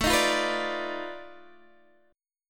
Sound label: B 7th Sharp 9th Flat 5th, guitar